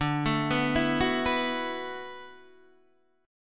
D 6th Add 9th D, Guitar, Open D

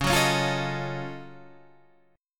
Gb Minor Major 13th Db

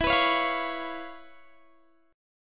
C sharp 7th E; C#7/E; strummed

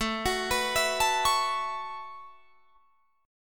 A 6th Add 9th, Guitar, Drop D

